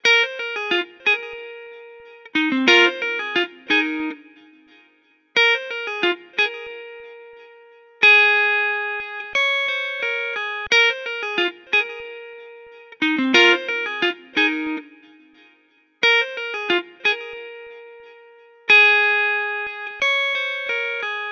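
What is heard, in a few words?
90 guitar pitched Ab major; aminata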